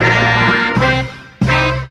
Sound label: horns